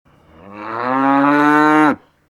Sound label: cow mooing